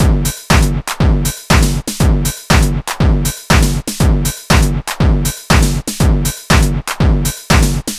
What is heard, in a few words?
120 trance beat, Drum & Perc Loops